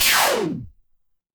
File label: retro game laser